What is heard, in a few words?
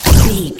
sci-fi weapon blaster laser boom heavy